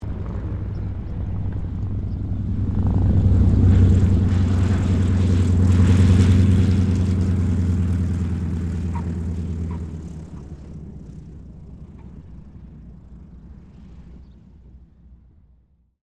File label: AIRCRAFT CAC CA-25 WINJEEL LAND STEREO, RAAF Winjeel fighter trainer; Airplanes; Vehicles